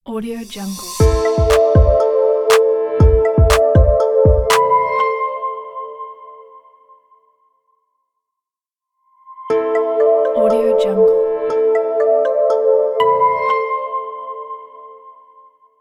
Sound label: intro ambient logo ident